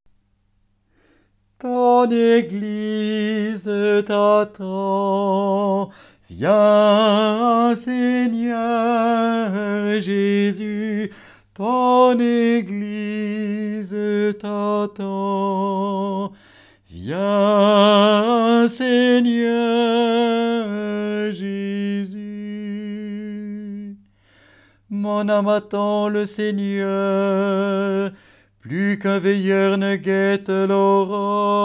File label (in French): Chant final; CHRIST ROI